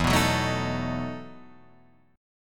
E Major 7th Suspended 2nd Suspended 4th chord, Guitar Open F; EM7sus2sus4 strummed